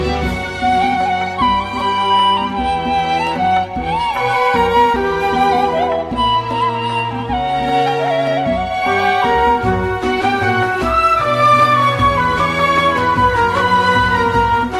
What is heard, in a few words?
Ney